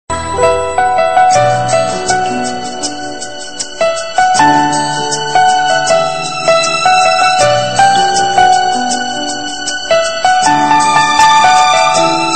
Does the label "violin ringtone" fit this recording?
Yes